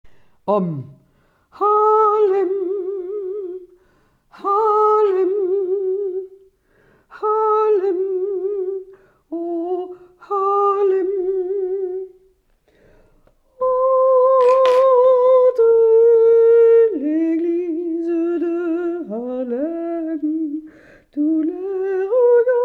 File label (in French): Hommes; Ténor et Basse